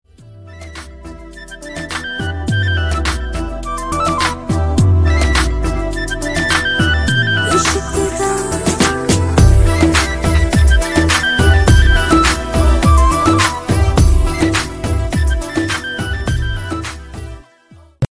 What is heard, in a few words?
classical flute ringtone